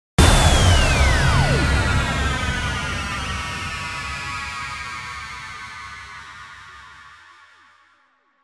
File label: YELLOW LASER BLAST IMPACT